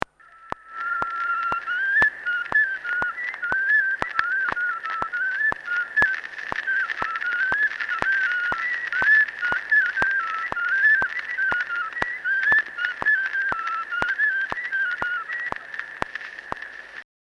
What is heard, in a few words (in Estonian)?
Pillilugu, vilepill folk; Kuusalu; Mart Masurin